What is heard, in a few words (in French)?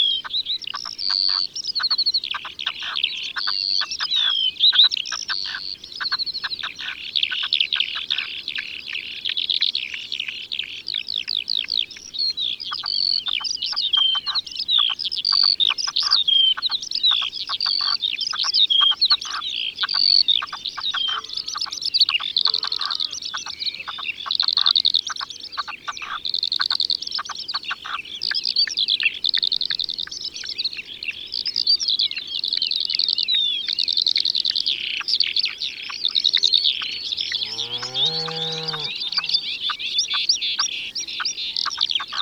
Perdrix Rouge; Ardeche; Concerts Naturels De l'Ardeche